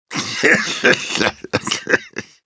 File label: Soldier laughshort, ru; Короткий смешок 3